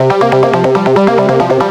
NRG Arp, trance

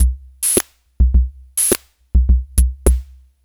Pocket 104, Lounge Drumz

